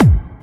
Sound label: hard bass drumz